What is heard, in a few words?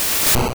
Comet Punch; attack moves; pokemon; gen 2; gsc